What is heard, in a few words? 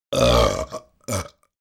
belching